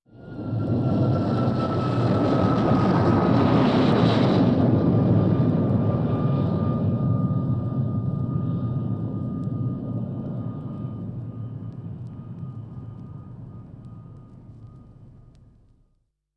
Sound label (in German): Luftwaffe Startender Kampfflieger